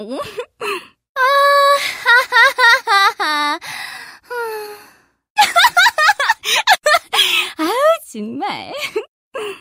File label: laugh; janna